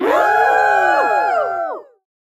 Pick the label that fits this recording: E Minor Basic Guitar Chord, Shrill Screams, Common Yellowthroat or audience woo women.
audience woo women